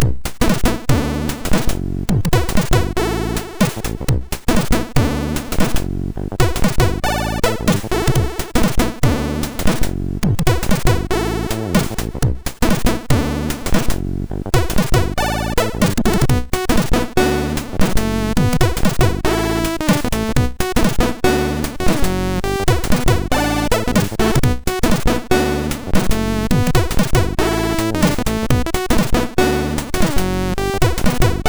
tune 1 sid MOS6581R2

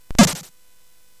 Tecnetium, CSG8580R5; hvsc

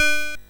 BASIC, MOS6581R3

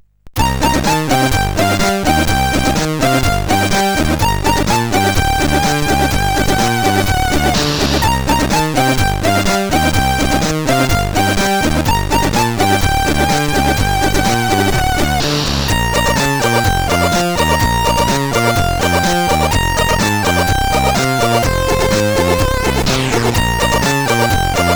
Tracker, CSG8580R5

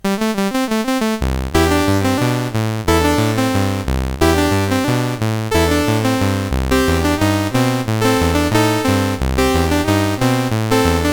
Shout, CSG8580R5

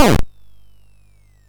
Frantic, MOS6581R4

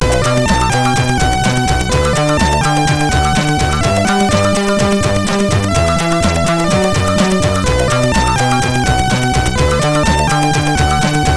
Intro Music sid MOS6581R4; X-Ample

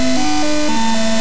sid CSG8580R5; Donkey Kong 1983

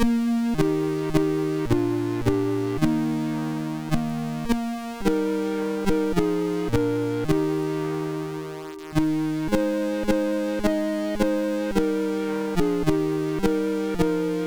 Xmas tune, sid MOS6581R3

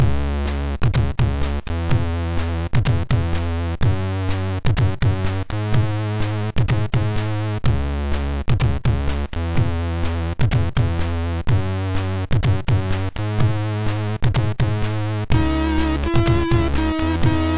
Ambience, MOS6581R2

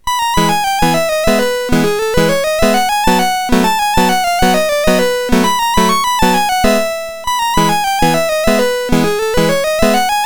Mexican Folk Song Medley, MOS6581R2; Joseph A Grau

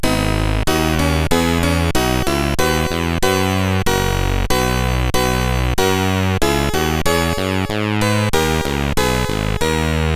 Bach CSG8580R5; VC-41

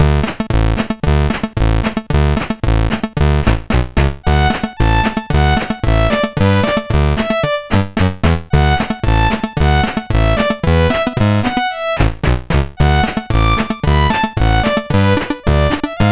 Country, CSG8580R5

